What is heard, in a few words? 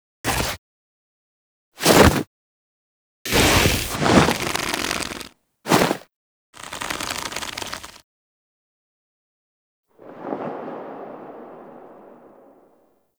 Hang On; Tenno; Warframe; The New War; Throne Room